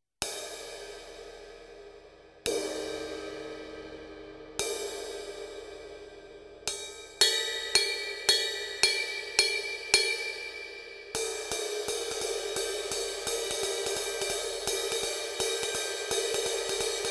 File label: MULTI PAISTE GB 18, CYMBALES